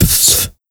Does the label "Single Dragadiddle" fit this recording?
No